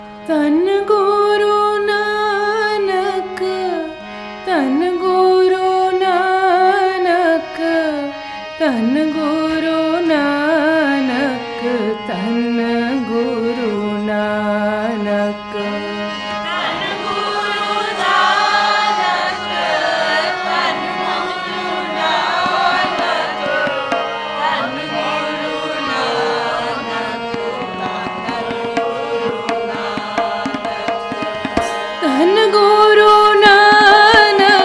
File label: Kirtan; May Virginia Samagam